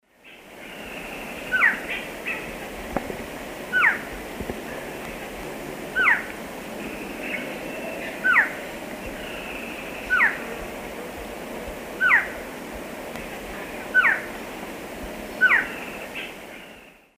Thamnophilus amazonicus, call female, S. America Eastern Lowlands